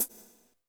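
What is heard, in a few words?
hi-hat, drums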